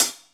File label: closed, Drums Percussion; Drum Bass; Zero-G; Total Drum Bass